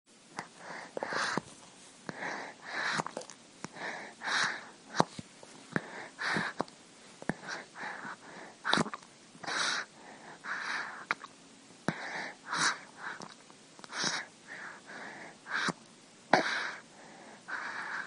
Breast Feed

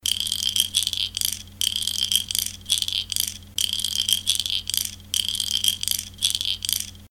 Baby Rattle

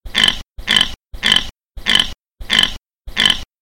Pig Snort

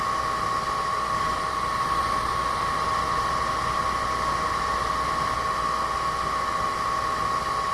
Hairdryer

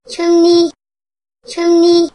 chimney

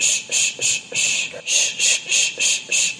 Shhhh

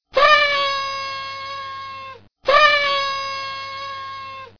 Party Horn

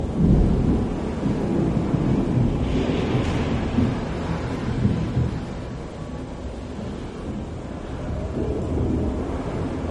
Thunderstorm

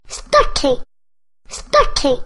stocking

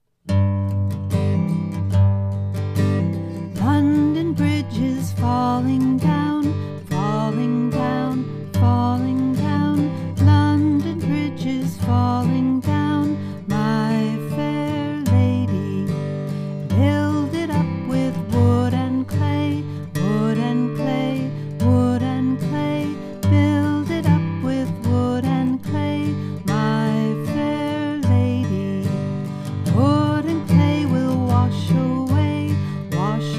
london bridge is falling down

